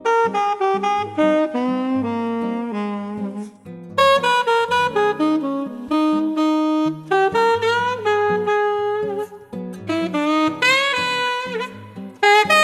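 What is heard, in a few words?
Muzyka dlya ucheby Udary, study music beats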